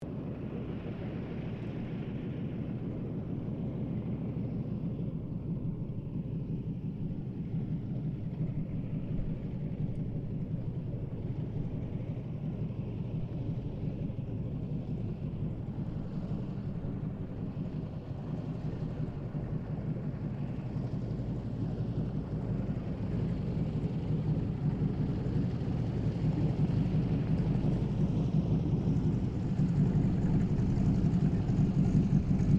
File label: Aircraft At-6D Harvard Mk Iib 1942 Taxi Shutdown Stereo, training aircraft; Airplane; Airplanes; SoundEffects Foundation2